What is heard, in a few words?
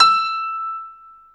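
SG1 PNO E 5, piano; Back In Time Records; Korg Universe VOL-1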